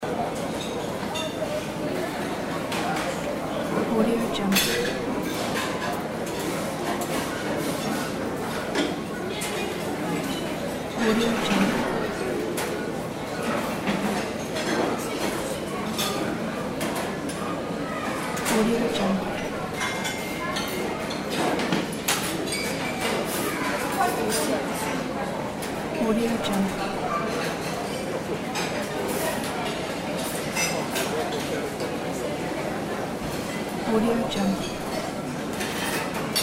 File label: restaurant ambience